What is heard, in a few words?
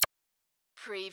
zapped